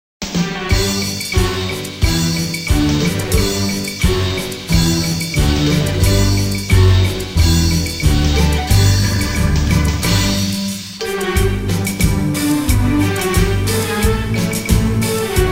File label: Horn Pipe, theatre organs